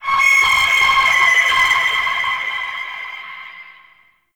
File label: Tonal Nightmare; Ambience; Warframe; Shadowgrapher